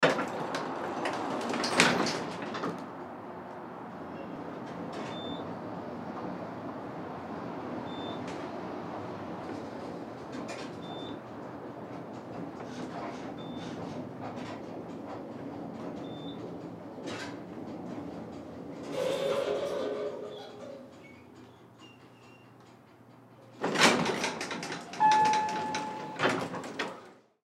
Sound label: Elevator Doors, Elevator Doors Close; Elevator Doors Close Ride Doors Open; Industry; Elevators; Sound Effects:Machines; Blastwave